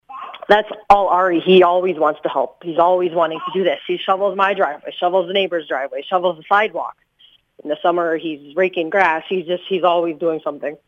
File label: shovel